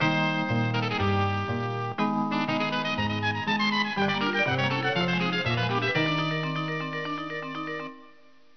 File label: Complete; Gals Panic II; arcade